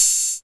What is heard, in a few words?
airy open hi hat sample a sharp key, Royality free open hi hat sound tuned to the A# note